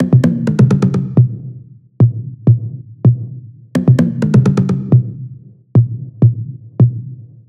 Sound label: Perc 128